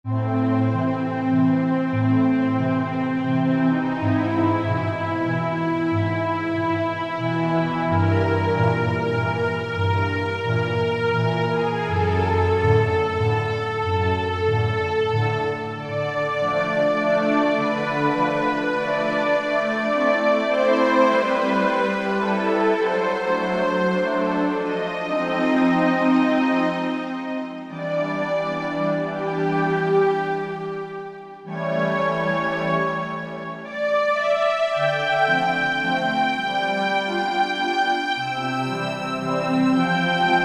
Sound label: Hyb Orchestra